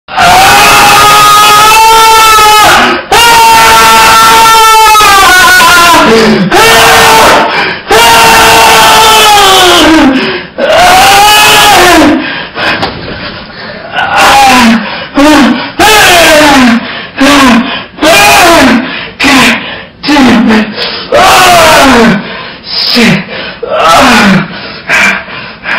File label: Loudest orgasm ever earrape edition; WARNING EARRAPE